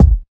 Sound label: natural bass drum sample a key; kick drum samples